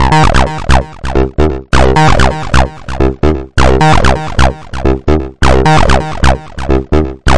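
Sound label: tb303 acidline, 130 bpm; SYNTH AND ELECTRONIC LOOPS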